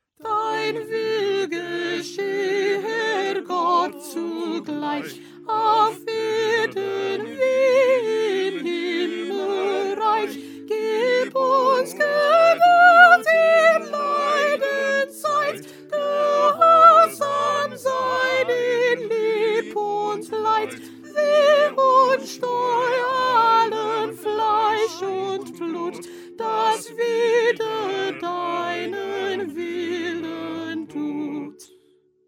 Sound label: Soprano; Bach; St John Passion